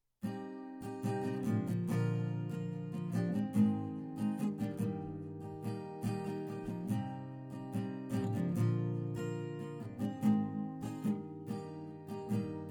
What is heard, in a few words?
scratch